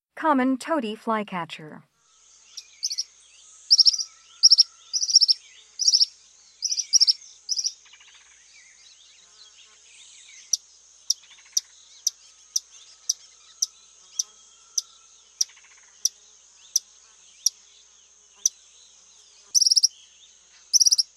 Common Tody-Flycatcher, Nature's Songs, S. America